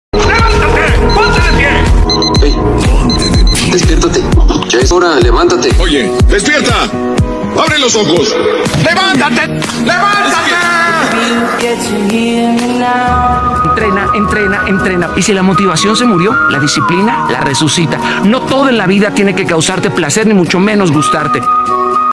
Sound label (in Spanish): alarma motivacion